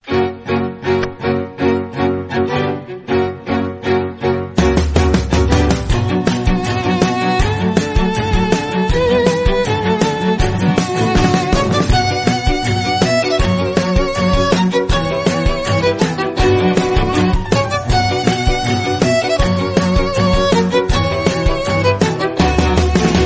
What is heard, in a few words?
maddalam, malyalam